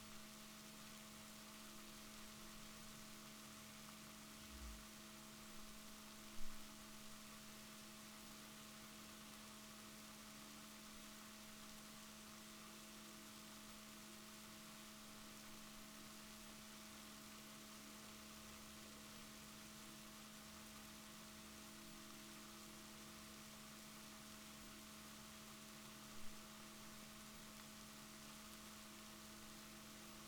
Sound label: rorquals negatif, Balaenoptera Physalus Chile